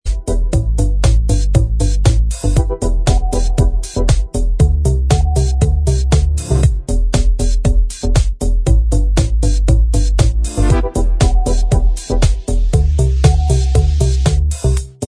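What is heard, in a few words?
Instrumental Track, backing tracks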